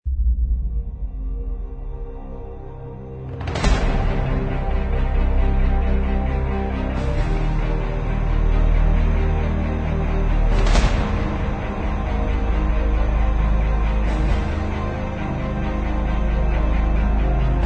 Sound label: Epic Trailer No Choir